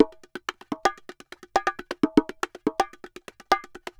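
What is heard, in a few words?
BONGO SALSA, salsa drums and percussion; Best Service ProSamples vol.14; AKAI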